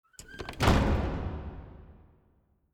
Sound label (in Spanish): puerta